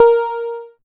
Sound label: A#, PIANO; Big Fish Audio; Synclav FM